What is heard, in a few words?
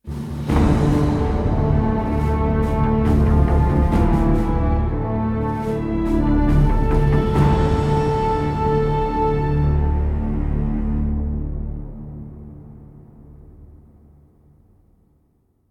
Cold; Mass Effect